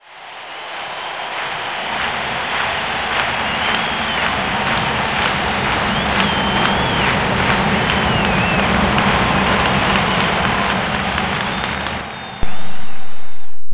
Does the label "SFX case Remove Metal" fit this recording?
No